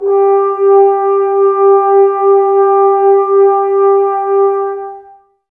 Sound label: Brass, Woodwind Brass Ensemble